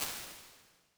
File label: bright plate, Plate Reverbs, IR Samples; Convolution; Kontakt 5